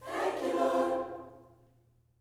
THANK U, Gospel; Spectrasonics; GOSP PHR

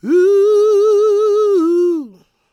GOSP MALE, SOLO; Vocal Planet Vol1 Gospel

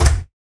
Cooker Door, household rhythm samples Hits

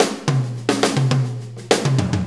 4-4 Fill, Drum Loops

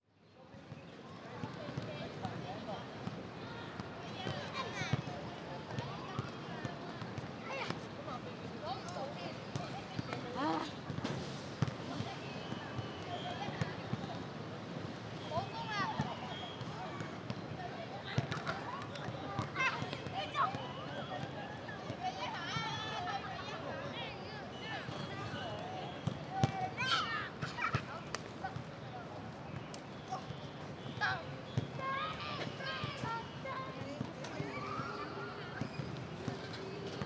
Ambience, Kids Playing; City Sounds

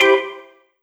orgharm mid; Sonic Foundry (Sony Creative Software); Synchro Funk; One Shot Sonics

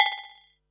Perc, Percussion and FX; Pearl SC-40

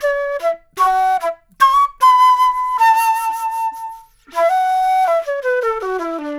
Flute, Jazz; Flute Loops; Sonic Foundry (Sony Creative Software)

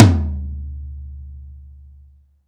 TOM D, Drum Dry; East Collexion; Drum 1

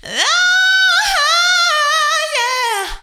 ad lib high, female vocals; PlatinumPhattCD2; E-MU Producer Series Vol. 8 – Platinum Phatt

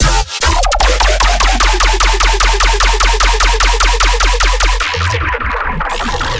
bass loop acid b section 150 F; Virtual Riot

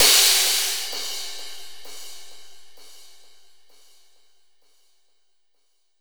Swish Crash; Zero-G; Soundclash Nu Dance Electro; Construction Kits; Feet First

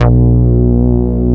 ESQ 1 REZ, SYNBASS; Synthesizers; Soli-Music; World of Synthesizers VOL-1

